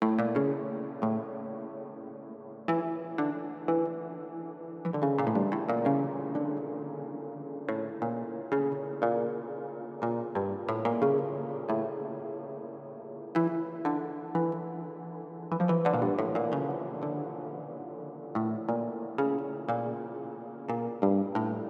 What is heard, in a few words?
Ethnic Plucks 90bpm